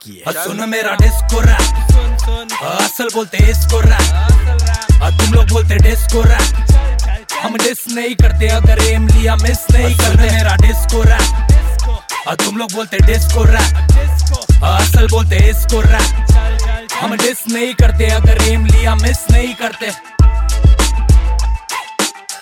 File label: Disco Rap Ringtone